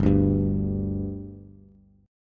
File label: G 5th G Bass, chord; G5 strummed